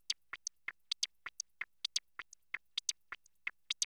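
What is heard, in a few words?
HAT loop; East West - Phat & Phunky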